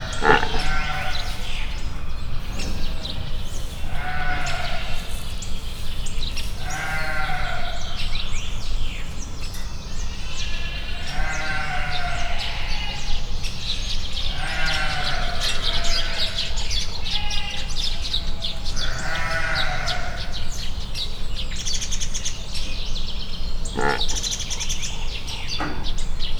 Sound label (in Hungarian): házi bivaly is, házikecske; miskolc zoo